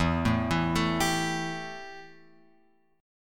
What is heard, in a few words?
E Minor Double Flat 5th E; Embb5; {0 0 2 2 X 3} arpeggiated